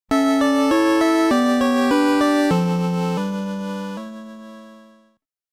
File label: Save Music